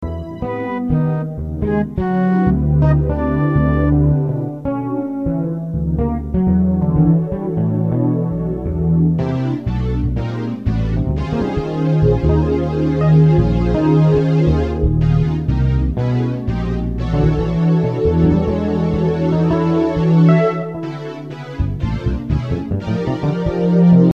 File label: fantasy on fretless bass